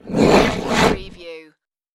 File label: dog monster growl